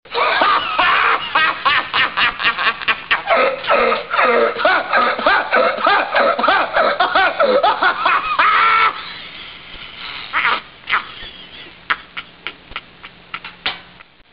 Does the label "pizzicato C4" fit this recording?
No